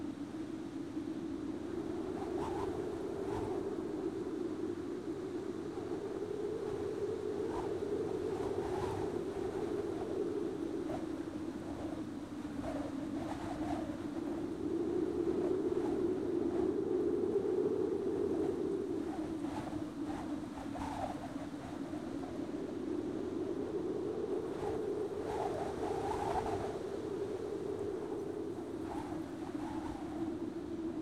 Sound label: ambient map